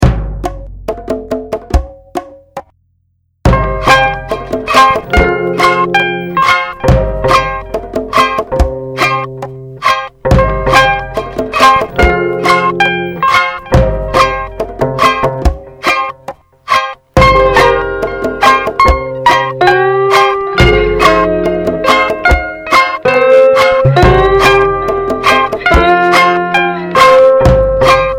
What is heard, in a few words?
dub